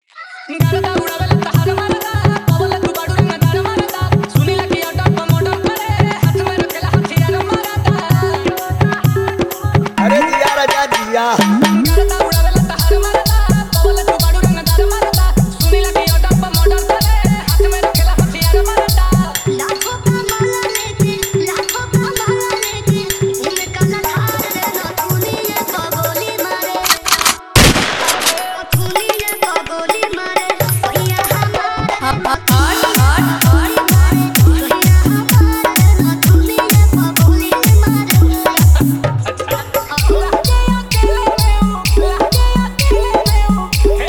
Insta Viral Bhojpuri Dj Remix Songs

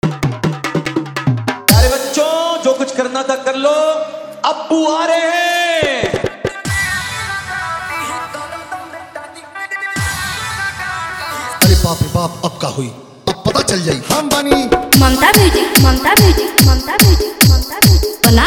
Bhojpuri Hard Bass Dj Remix Song

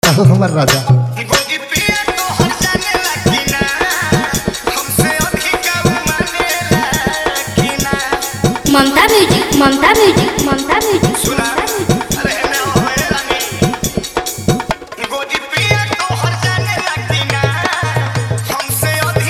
New Bhojpuri Dj Remix Song

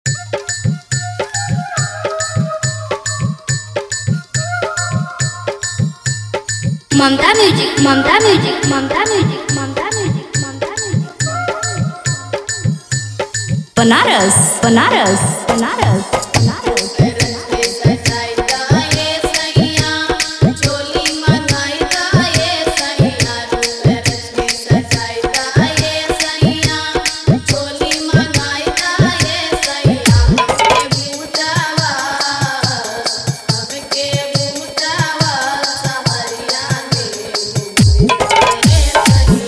Old Bhojpuri Dj Remix Song

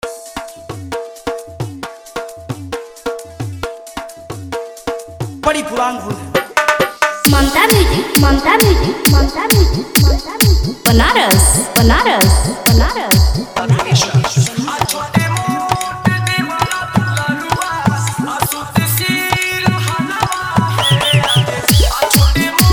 Old Bhojpuri Dj Remix Song

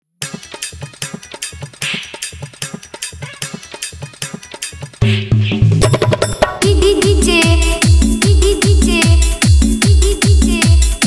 Hard Bass Toing Mix

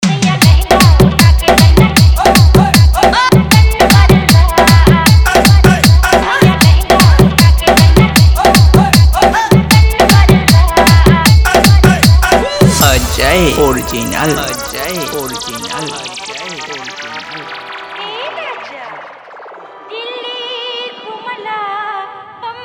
Bhojpuri Dj Remix Songs, Vibrate Dj Remix Song

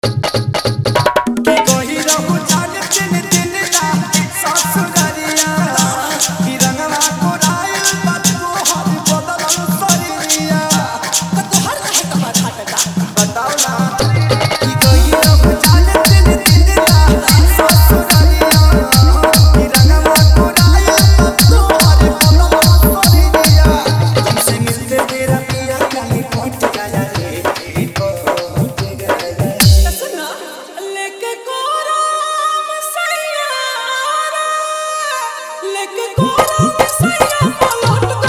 New Bhojpuri Dj Remix Song Hard Vibration Mix

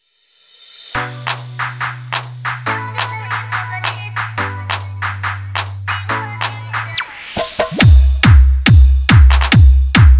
Bhojpuri Dj Remix Songs

